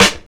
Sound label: Snare